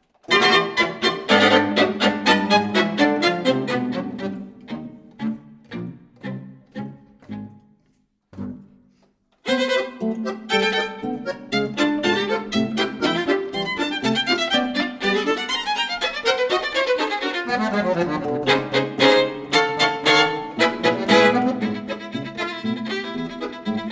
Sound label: Polka schnell; Friedrich Cerha